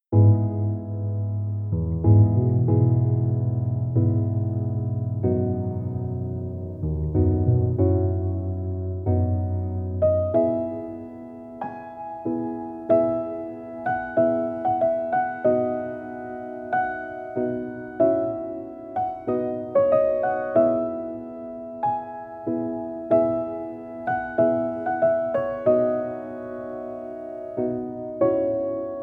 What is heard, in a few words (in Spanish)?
Musica Relajante Para Estudiar, Piano